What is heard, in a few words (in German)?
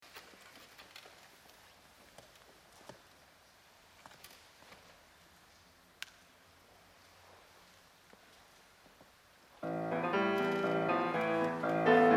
Klaviersonate; Schröder